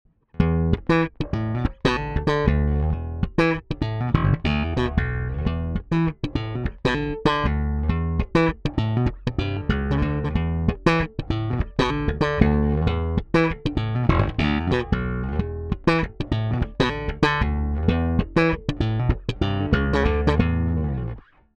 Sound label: Preci Slap